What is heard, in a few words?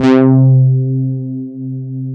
DS8 C 3, Korg